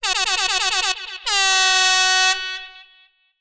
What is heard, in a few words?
musical air horn dancehall style; sound effects one